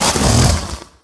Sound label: crocodile hit; DAoC